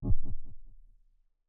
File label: clicked; gnome-audio-k1; gtk-events